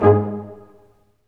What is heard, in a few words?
BRS, Staccatos, Ensembl stac; Roland L-CD702